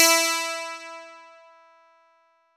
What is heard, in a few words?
E3, PIANO; AKAI S-Series CD-ROM Sound Library VOL-8